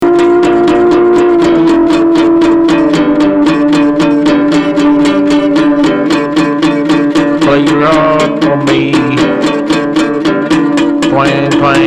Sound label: harp clip